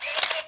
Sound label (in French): clic clac